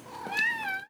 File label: Cat Meows - Food Time; Micro Pack